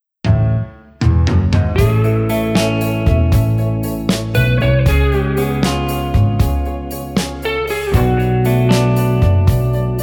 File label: Let My Guitar Ring; ivox